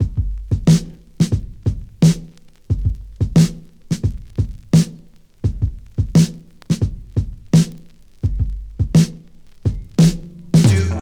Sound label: 87 bpm drum beat f sharp key